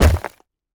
Hit; Ice Mage

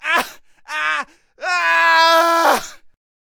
Harpooner Death Vocals

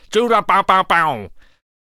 Gunslinger Attack Vocals

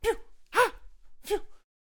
Poacher Attack Vocals

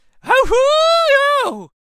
Lasso Attack Vocals

